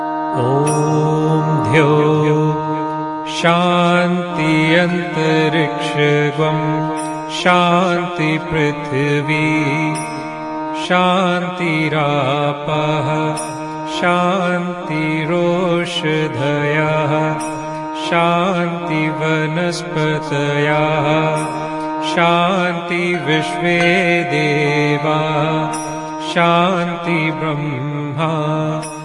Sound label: Om dhyo shanti antraksh gwang shanti mantra ringtone